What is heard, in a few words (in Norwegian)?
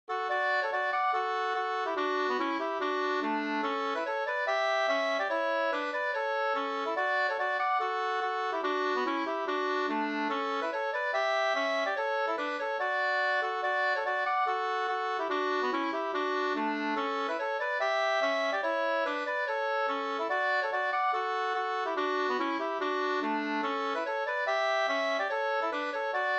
reinlender